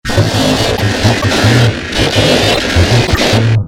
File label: chase; slender; spongebob